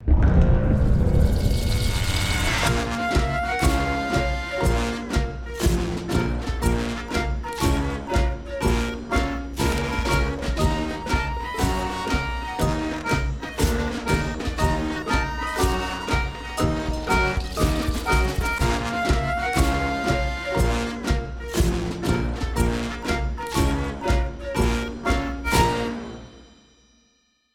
Stinger Extended; Scrap

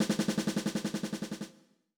Drum Score; lullaby days windows gamerip 2022

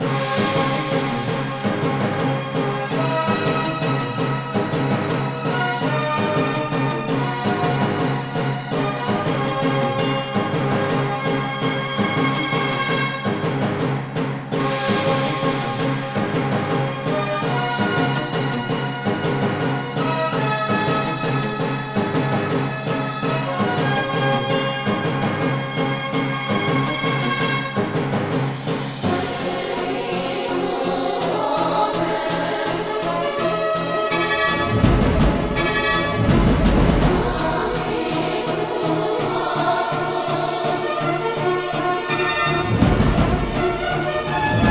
Arena Rome Grandiose Combat; Dungeon Hunter 3; android ios

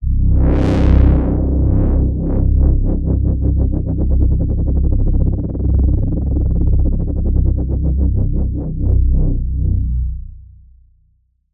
boss4 intro transform stinger 120bpm

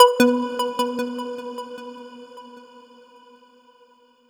Overlay Tone

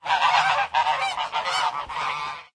Goose Panic

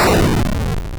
Close; Centipede

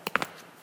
Footstep; Five Nights at Ronald's

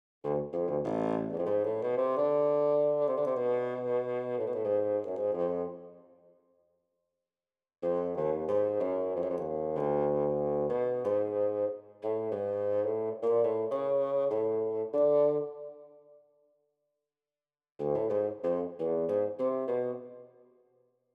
Bassoon Melody 94bpm pickup; Skylar & Plux: Adventure on Clover Island; PS4, Windows, Xbox One

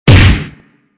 High Impact

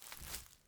foliage jump

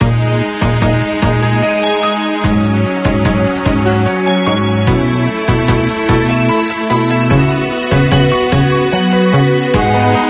Dungeon; Liege Dragon; Android; Xbox Series X/S

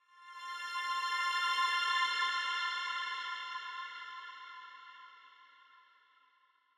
Mountain Music, Success Stinger Louder; Rhythm Temple

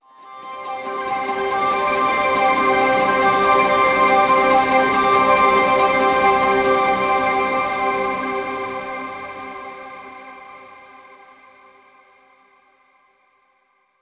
Overlay Tone

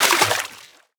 Object Enter Water

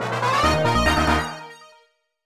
Key Item Obtained; Fossil Fighters Champions; Nintendo DS

